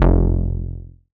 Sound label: bass F1, ARP Odyssey